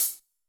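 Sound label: half open hat; Greytsounds; Scott Peers Sound Engineering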